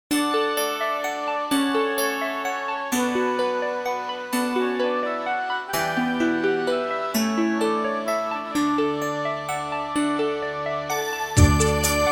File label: Instumental of we wish you